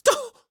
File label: histeric short; donnie; Blind Drive